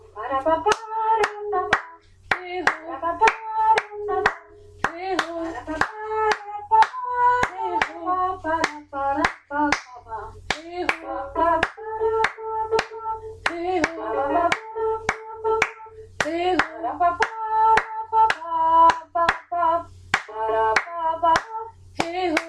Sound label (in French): candombe rythmique